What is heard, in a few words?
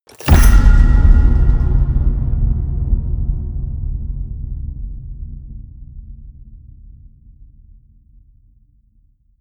metal crash hollow ring out medium